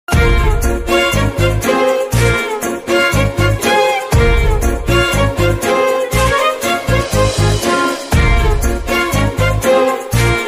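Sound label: best love background music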